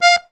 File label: AKK; Best Service; Alpine Volksmusik; AKKORDEON